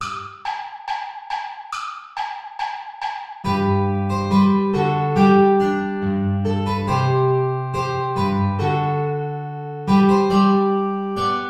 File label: play along without guitar; praetorius michael ballet